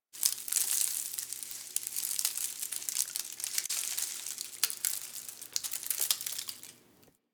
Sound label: Derelict Leave Drop, Ambience, Interior; Warframe; Orokin Derelict